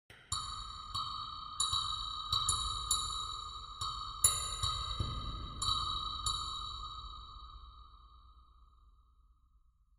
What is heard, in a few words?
ambient synth grain click